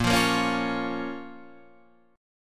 Bb Minor, Guitar, Half Step Down; chord shape

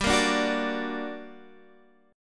G Minor Major 7th G down Guitar Drop D

